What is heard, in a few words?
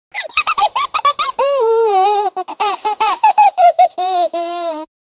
gentle background music, baby bear